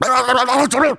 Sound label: Shout; Mothersday